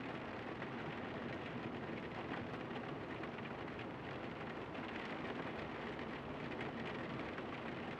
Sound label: rain inside; CrossCode; background